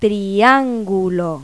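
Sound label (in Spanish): triangulo; Pipo-Aprende musica con Pipo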